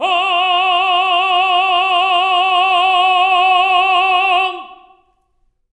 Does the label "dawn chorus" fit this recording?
No